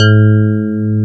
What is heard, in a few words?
KEY EP1 HRD, EP + E.Grand; Roland L-CD701; Pop Pianos 2